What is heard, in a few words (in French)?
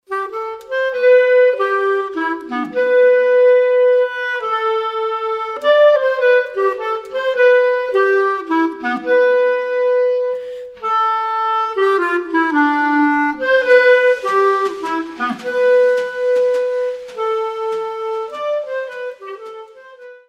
Mazurka - Belgique